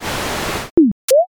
gacha roll

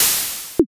airlock

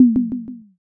tsuzumi

